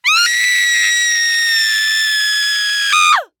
Horror Scary Human Female Scream Blood Curdling Scream